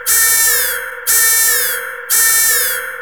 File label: alarm; gesource